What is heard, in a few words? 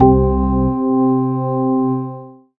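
organ, c, House Selection; Synths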